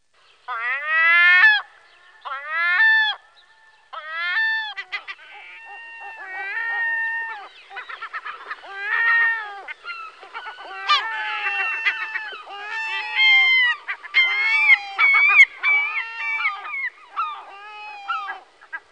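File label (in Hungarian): Sárgalábú sirály; Madarak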